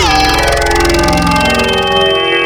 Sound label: Metallic, Wavetables; Cymatics; Essentials Wavetables; Serum